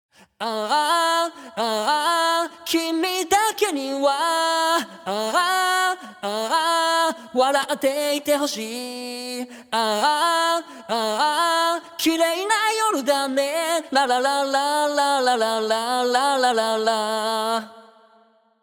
vocal studio verse on

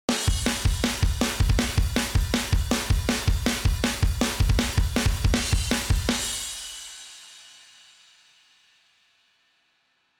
drum On

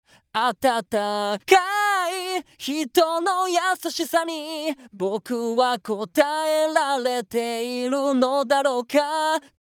vocal off

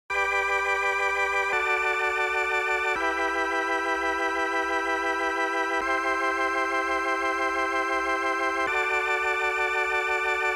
tape Delay Off

